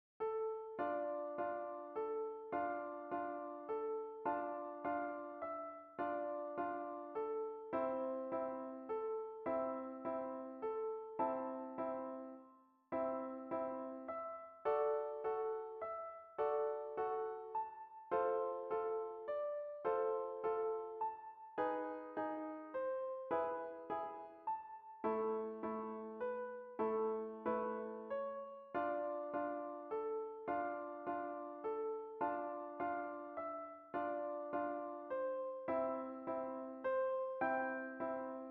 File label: kabalevsky waltz piano